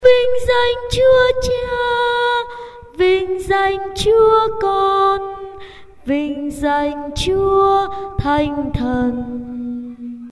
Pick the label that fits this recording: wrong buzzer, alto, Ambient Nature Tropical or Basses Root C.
alto